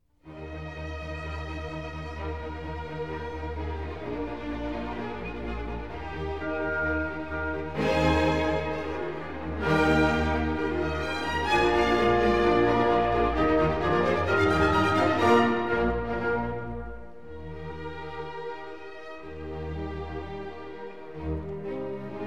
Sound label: Horn Concerto No. 2 first movement; Mozart; Legend of Galactic Heroes; Galactic Empire SIDE